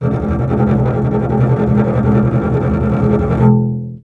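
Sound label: string contrabass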